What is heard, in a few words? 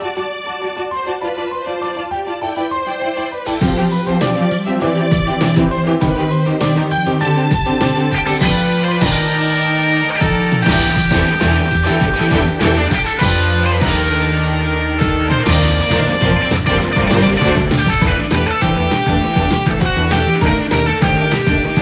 loop 200bpm; finalboss phase04; chicory a colorful tale; ps4